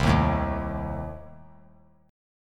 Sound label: C Minor 6th, chord; Cm6 strummed; JGuitar